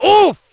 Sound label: ooff; Splashdown; XBOX